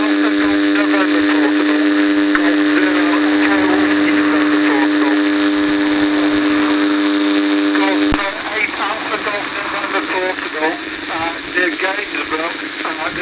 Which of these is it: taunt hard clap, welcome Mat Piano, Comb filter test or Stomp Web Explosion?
Comb filter test